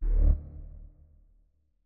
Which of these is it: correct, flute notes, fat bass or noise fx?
flute notes